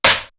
METAL STACK; Univers Sons; Basicussions

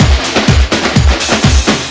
stomp, Deep House, 125bpm

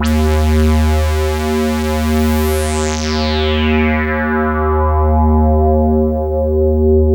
LUCKY SWP, SYNTH; Keith Emerson Signature Series

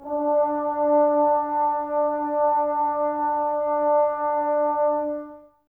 BRS TBS D, Woodwind Brass Ensemble, Brass; Miroslav Vitous - Woodwind Brass Ensemble

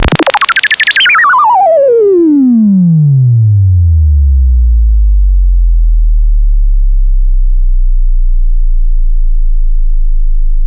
FX SWEEP

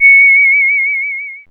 C#, Synclav FM, WIND; Big Fish Audio

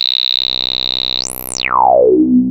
Classic FX; radiosonics; Classic Simple OSC FX

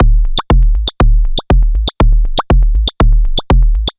Anabeat Mix, Beats

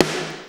Snare, Fairlight CMI III

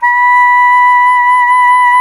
SAX SOP SAX, SAX Soprano Long

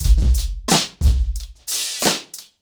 90BPM, acoustic drums; The Roots Drumloops

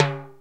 TIMBL LO; Crypton - DrumBase5000

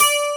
12STR, PIANO; AKAI S-Series CD-ROM Sound Library VOL-8

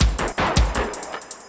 LOOP L, Drum & Bass 160 BPM

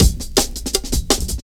Drum Loops, Jungle; Zero-G Jungle Warfare 1, 2 & 3

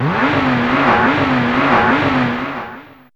Rev, Fairlight CMI IIe

